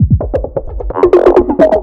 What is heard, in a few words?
Drums Strange